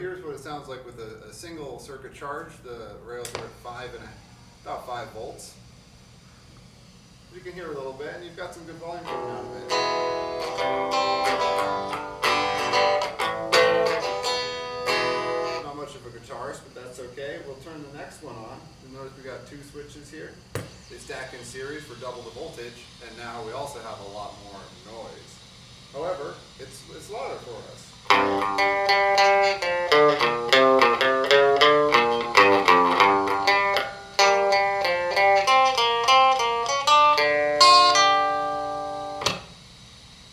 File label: Using a Motor Driver as a Class D Amplifier